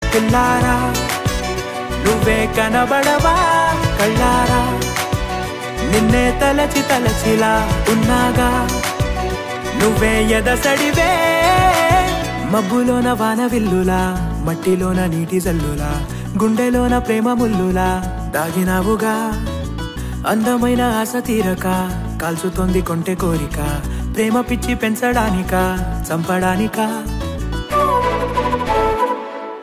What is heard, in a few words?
Song Ringtone; RX100